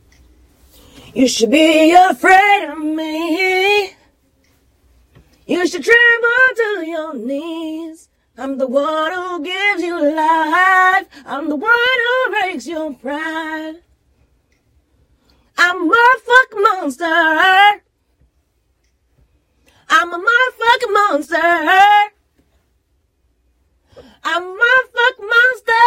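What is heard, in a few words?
pop, 82, E flat minor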